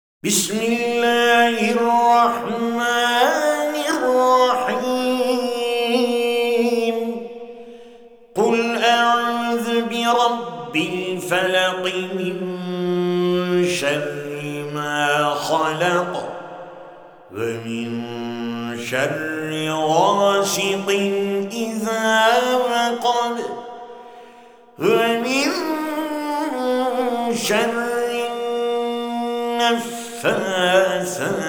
qur'ân recitation, istanbul, turkey, falak

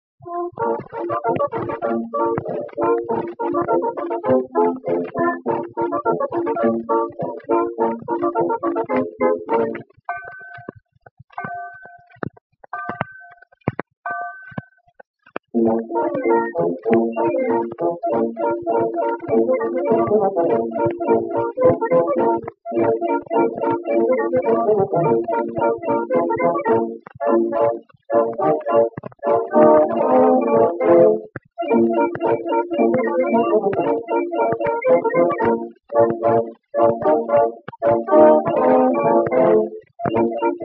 Orchestra; 1900 Zon-O-Phone-9314

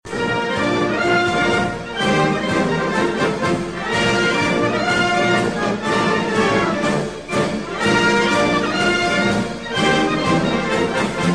brass; military music in Italy; FASCISM; ETHIOPIA

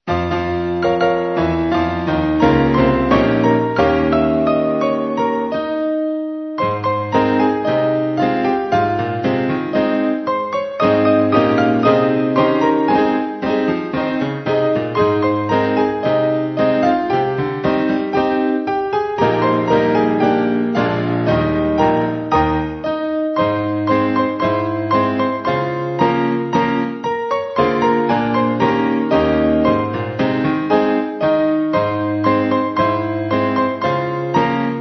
Aeolian Piano Roll

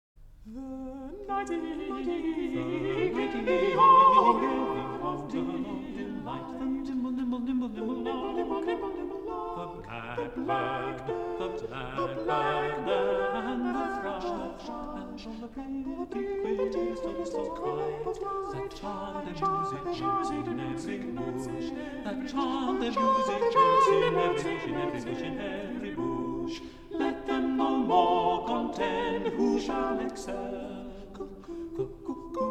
English Madrigals; Weelkes; All at once well met

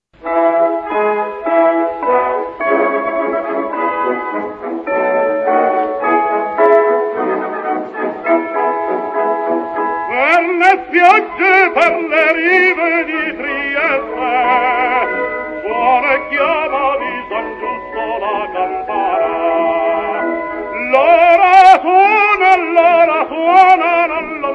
solo, military music in Italy; WWI

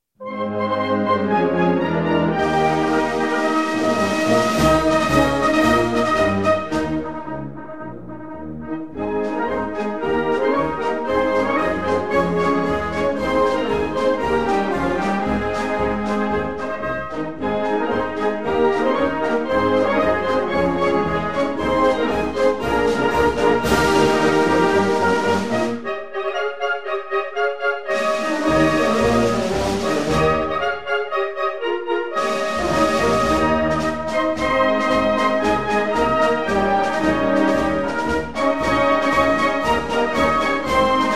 brass, military music in Italy; WWI

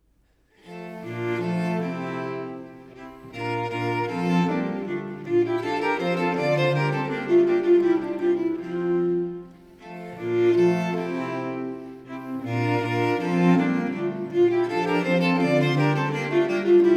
Basse Danse

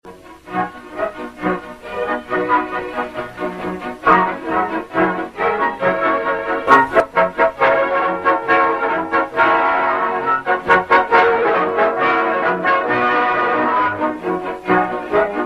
orchestral, military music in Italy; G.I.L.; FASCISM

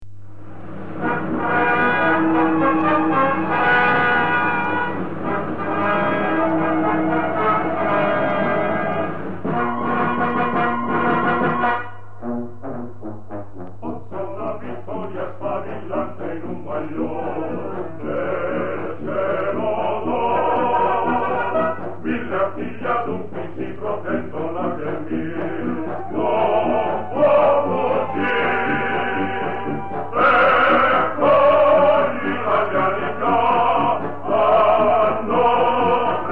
choir; Adua; military music in Italy; FASCISM; ETHIOPIA

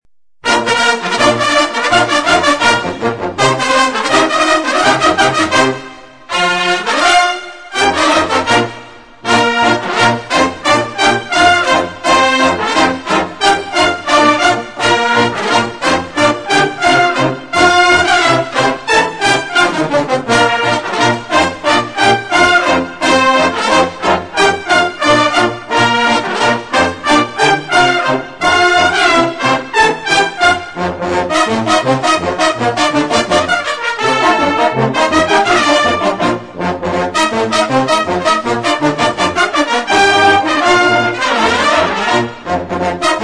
brass; MILITARY MUSIC IN ITALY; WWI

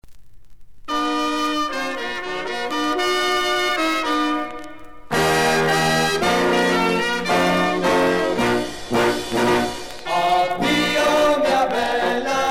choir; Addio mia bella addio - L'Addio del volontario toscano; military music in Italy; RISORGIMENTO; COLONIAL WARS

